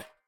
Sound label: step, copper golem weathered; Minecraft